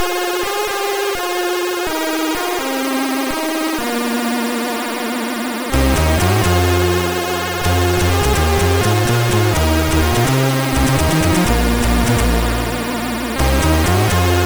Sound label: MOS6581